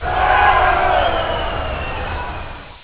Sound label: cheers; Disappointment